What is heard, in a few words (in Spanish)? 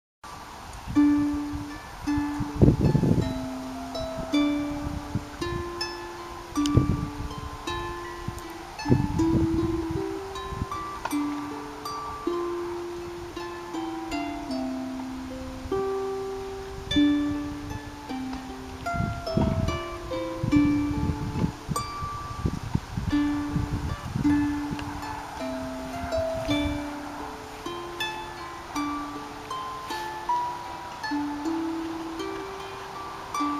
arpa